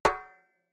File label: Step Metal